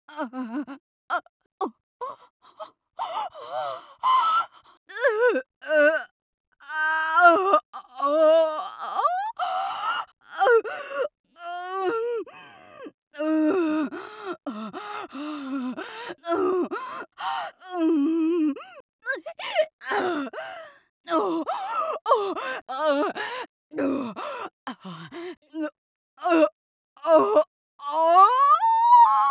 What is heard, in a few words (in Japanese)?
咆哮喘ぎ